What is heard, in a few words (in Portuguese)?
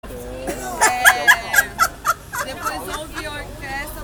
risada